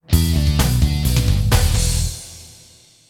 Rock Riff Tag

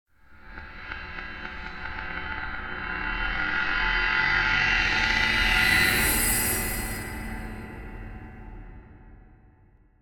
Metal Reversed Cymbal Creaking Increasing - Long; Hollywood Edge; sound designer's toolkit v2

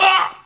Hit; Blind Quest - The Frost Demon

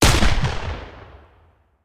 Hand Gun; Sound Ideas; Guns

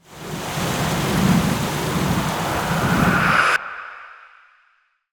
Ice Spell Freezing Slowing Cold Embrace; Arctic Aura

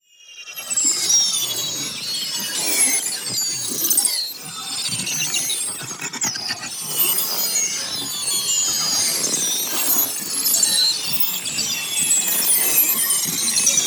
PARTICLES SMALL SLOW Crystals; boom library; Neon

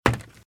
Footsteps - Wood - Creaky - Run - Left

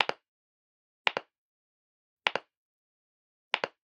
Generic Button Plastic Small

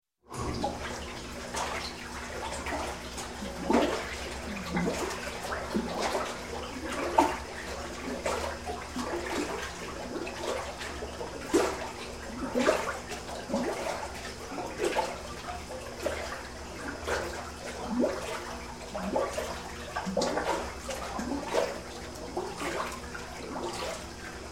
Milk Tank Milk Being Stirred In Tank, Industry; Sound Ideas 2000 Series

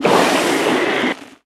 Poison; Kilta

